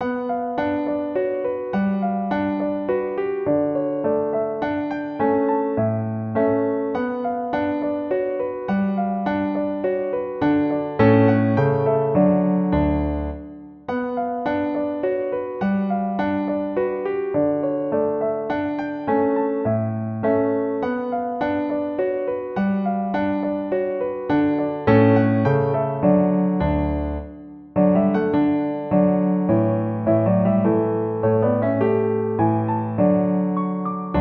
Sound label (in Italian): Danza in re piano